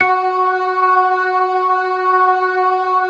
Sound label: ORGAN, 4 DRAWBARS; Keith Emerson Signature Series